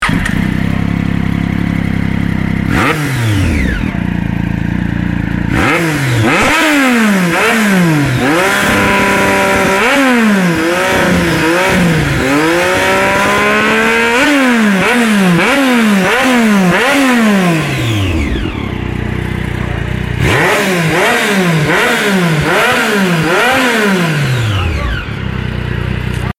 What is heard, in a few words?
sound triumph daytona 675r